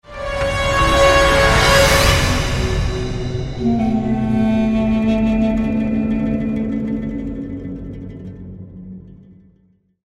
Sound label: Desert battle end